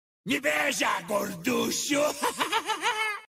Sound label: hahaha